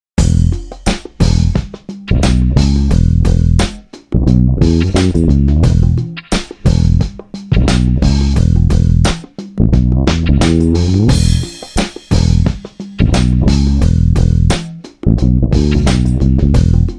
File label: me-20b patch; ME-20B Bank 9, No. 1; '60s soul sound.